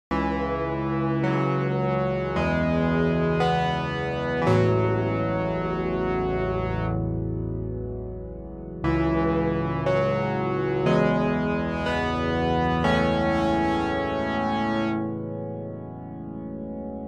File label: With ADSR